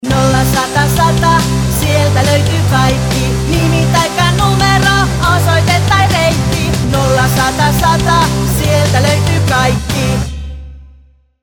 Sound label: Jingle; mainosmusiikki